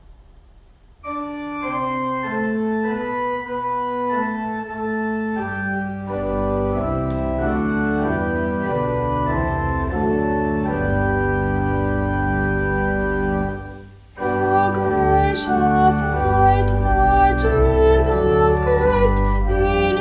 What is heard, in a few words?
last verse canon and organ; o gracious light